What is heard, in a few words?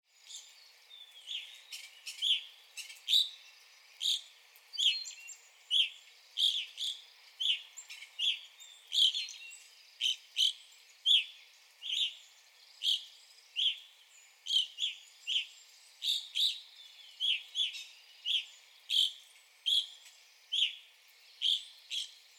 Evening Grosbeak